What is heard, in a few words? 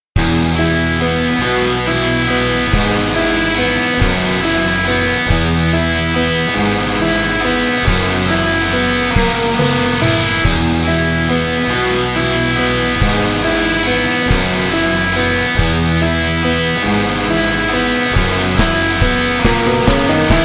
Rock Tracks